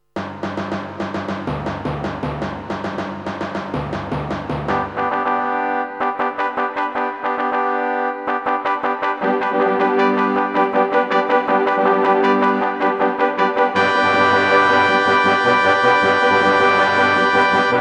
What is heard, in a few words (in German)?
zirkusmusik fanfare kurzfassung